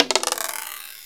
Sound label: SPITTLE; Rarefaction; Poke in The Ear With A Sharp Stick